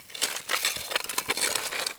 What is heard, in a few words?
crackle ice; Sound Design Tools; Raw Foley